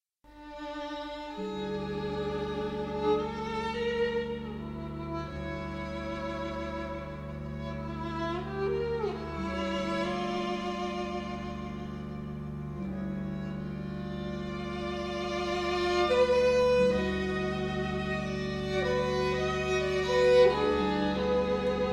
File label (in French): Concerto pour violon et orchestre Largo